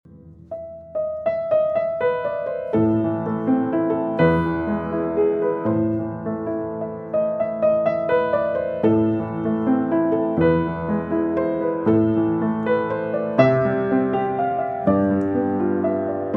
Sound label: Piano STAMT